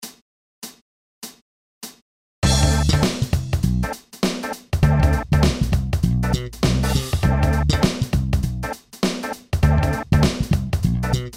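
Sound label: funk backing track; 50licks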